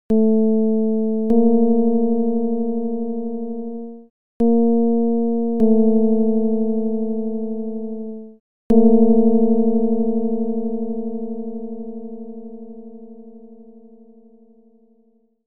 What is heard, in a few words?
csound foscil 220hz; Ji 19 18